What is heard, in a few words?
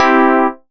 DX Electric Piano Chord; Big Fish Audio; OSH Chords